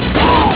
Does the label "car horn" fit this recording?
Yes